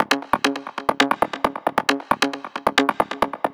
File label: 135 DRUMLOOP; Breaks; Loopmasters; Keith Mackenzie & Fixx Present Booty Breaks Vol.2; DRUM LPS